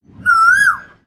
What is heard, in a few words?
Koel Bird Call single; iphone ringtones